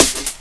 Snare + hat; Urban Shakedown

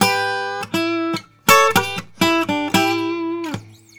Blues Guitar; ILIO; Fretworks; LICK

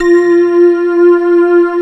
SYN SYN, Roland, digital; Sound & Vision - Gigapack I CD 2

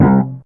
Bass pop C3, Fairlight CMI IIe

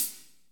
HAT, Fast Rock, Roland; Northstar

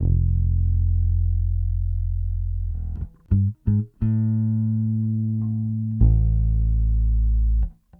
Bass, 70s Analog Funk

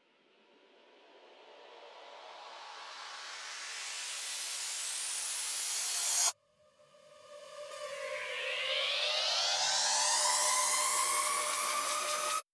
Drum Loop FX, 128BPM; VEC5 Drumloops; VEC5 Multi Loops 128BPM; Loop Elements

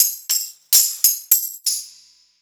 808 trap Tambourine; Sliced

